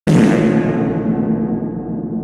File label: fart echo boom sound